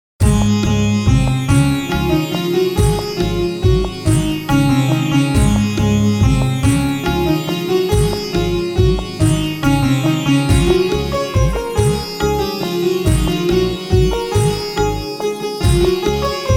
Sacred Sitar Bliss Soothing Devotional Background Music